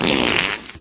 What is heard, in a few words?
fart medium